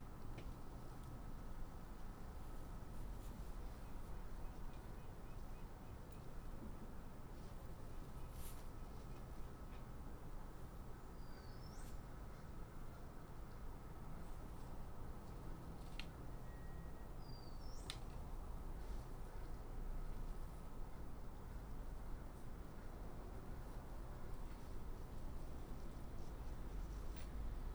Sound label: birds, gazebo, wind, morning apartment ambiance